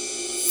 REV Ride, Roland JV-2080

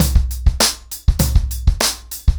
100BPM, ACOUSTIC DRUMS

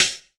HH, Drum Mashines; EdgeSounds

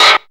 Perc FX, Percussion; Best Service ProSamples vol.37 - Dance Synths

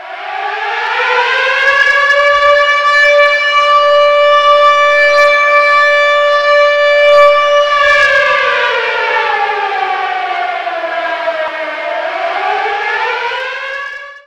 alarm, FX & ATMO, ALARMS & ALERTS; Techno Trance Essentials

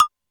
Hi Synth, Alesis D4 drum machine

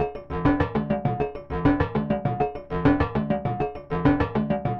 synth 100 stereocomb; Transmission-X

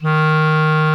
CLARINET D#3, REEDS; Greytsounds VOL-1

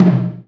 LARGE DRUM, JAPANESE PERCUSSION; AKAI S6000 CD-ROM - Volume 5; Ethic

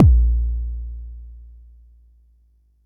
Bassdrum, Jomox Xbase09

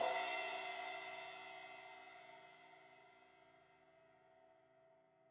Crash 16in edge, Cymbals; Deeply Sampled Drums

